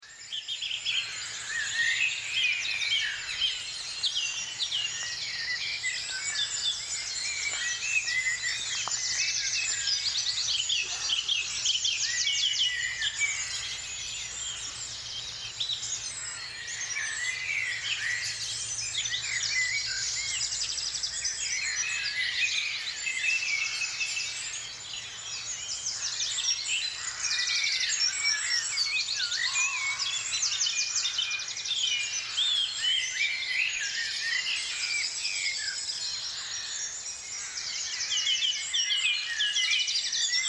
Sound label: Dawn Chorus; SmallWoodReserve; greenleaf storymap